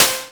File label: Percussive, Drums Perc One Shots; Sonic Foundry (Sony Creative Software)